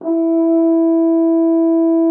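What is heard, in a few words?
French horn E4; Xsample VOL-3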